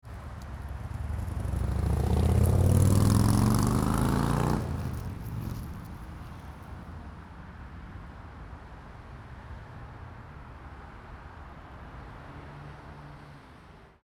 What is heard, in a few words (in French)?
Moto extérieure